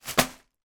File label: foley plastic bag items inside drop on table